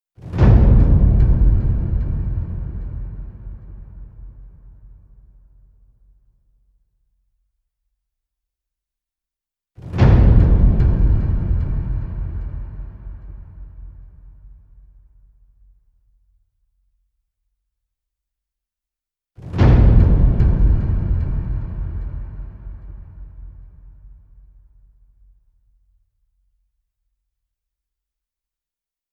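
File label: percussion only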